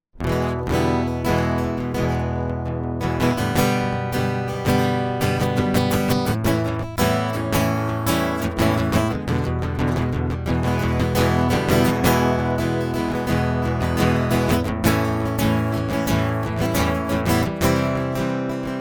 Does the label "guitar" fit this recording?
Yes